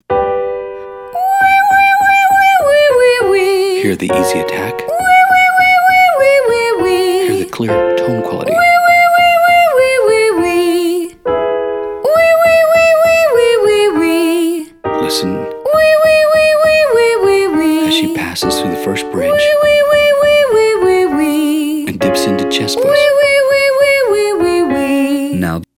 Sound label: Exercise oui females; Singing